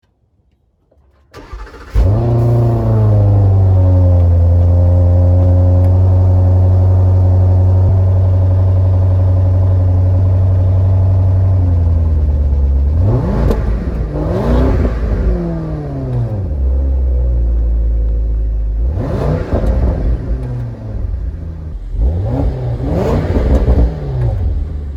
range rover p530 v8

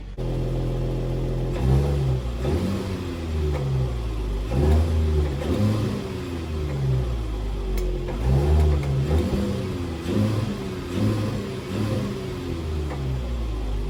mercedes benz gls 450

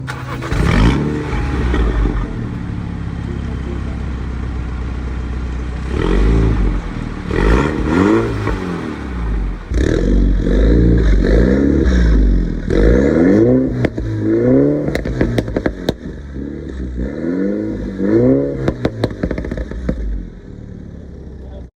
mini cooper s

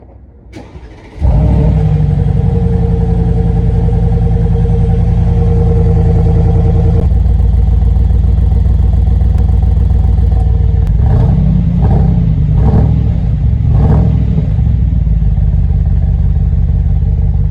mercedes g800

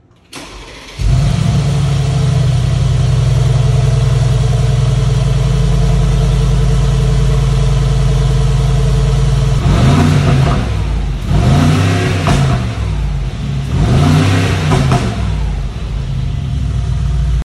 mercedes amg g63

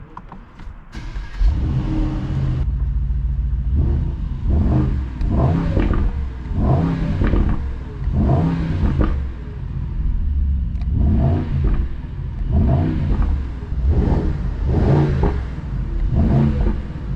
amg sl 55 roadster

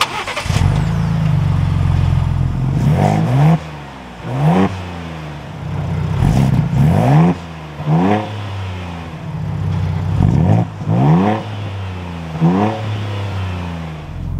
ford bronco raptor

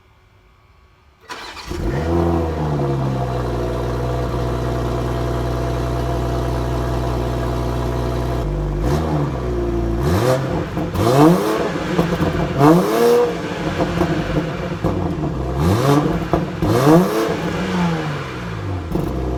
bmw x7

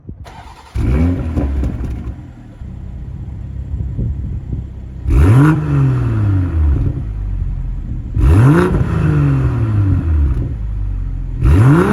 bmw m240i

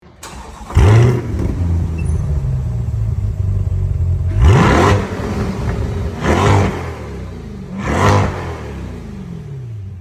corvette c8

